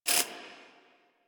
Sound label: Screen Glitch; Player; Warframe; Mini Games; Caliber Chicks Minigame